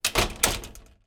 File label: household metal security grill gate door close